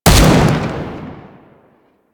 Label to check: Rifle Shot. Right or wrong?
Right